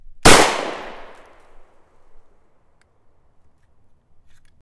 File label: 40 smith wesson single; mike koenig